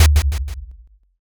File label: taiko